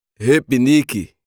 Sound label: Repinique